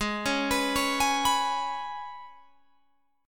G sharp Minor Double Flat 5th G sharp, Guitar Open G; G#mbb5 arpeggiated; 18,18,21,18,21,21